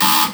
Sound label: buzzer, Train R142; OpenBVE; Jerome Yard